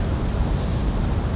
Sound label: Charging